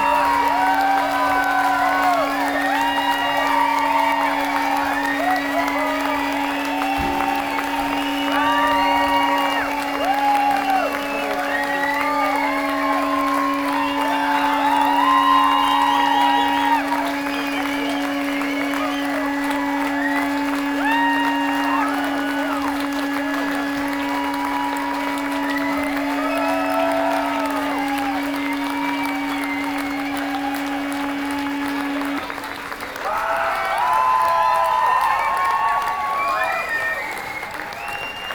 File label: Crowd